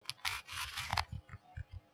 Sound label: shine; RubiCon